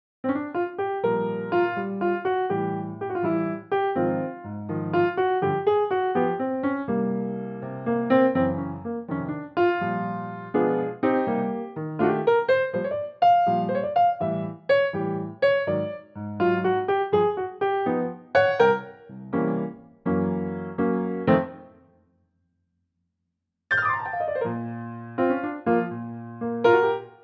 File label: piano Bb; key - Bb - vocal range - D to Bb; Pianotrak; film "The Colour Purple"